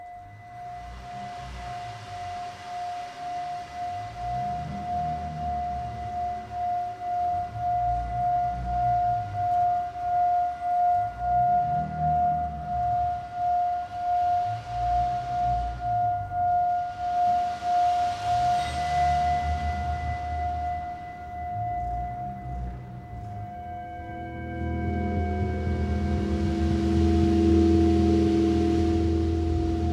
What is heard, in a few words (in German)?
Klang Meer